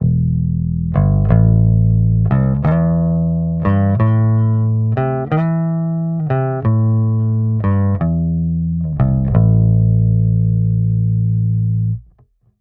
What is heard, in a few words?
bb1200 wide open